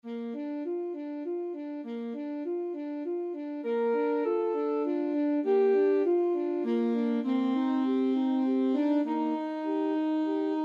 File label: alto saxophone duet